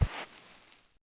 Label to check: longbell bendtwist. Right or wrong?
Wrong